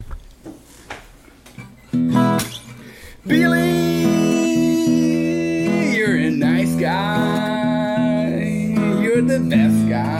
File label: crowd